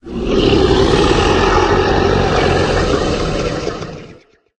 die

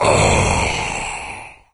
death; doom3; commando

